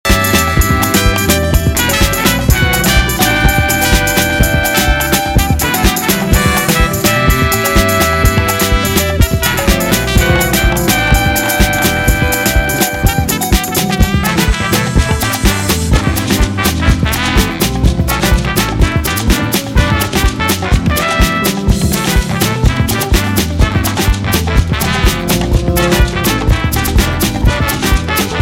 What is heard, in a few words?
afro jazz cafe instrumental